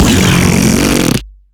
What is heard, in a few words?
poop laser